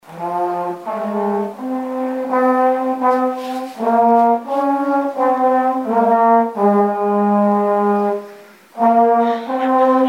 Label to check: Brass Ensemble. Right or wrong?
Right